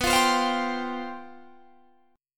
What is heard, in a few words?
C Major 7th Suspended 4th